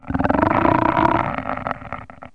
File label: growl; Hellboy; CD Expert nº 41